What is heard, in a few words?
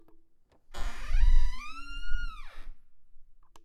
Door Squeak